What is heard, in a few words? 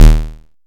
dry snares; Quasimidi Rave-o-lution 309